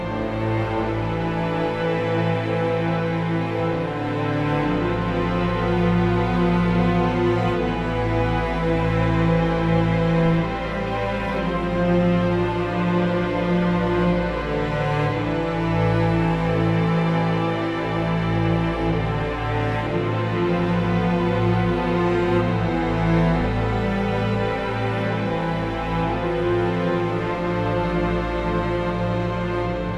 LOADING; brothers in arms earned in blood

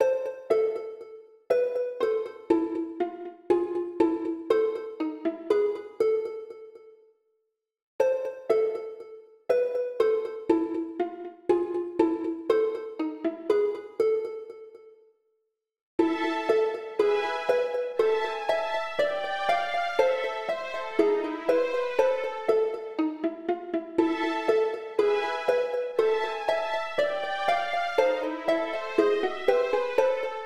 Main Music; Plants vs. Zombies

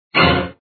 Startle

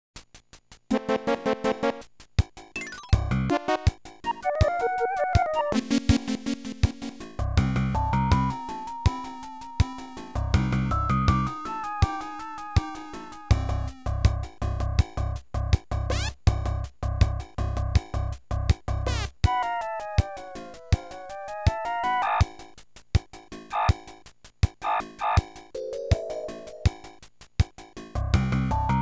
Cave; Elm Knight; Miriasu Cave

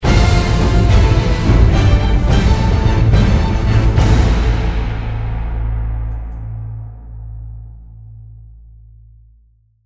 Finale Victory; sin episode 1

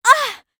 Female Fight Damage; mighty party 2017 gamerip pc ios android

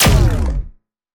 Danger Tile Spin

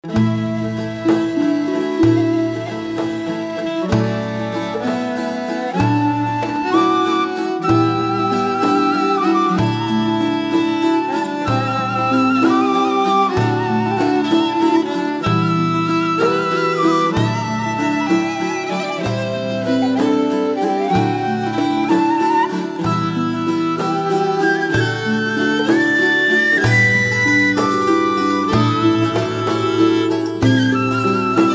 Tavern; Two Worlds 2

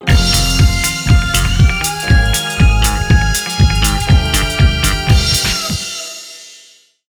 Match Lose; Disco Kid

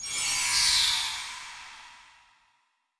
Btl Hitlrng Light

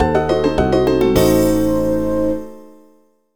Jingle Lost; Passeport du CE2 au CM1; NDS

